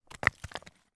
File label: impact stone large hit ground